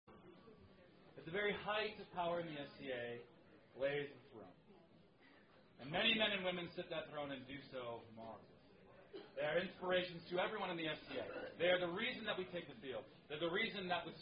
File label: Song; SCA Song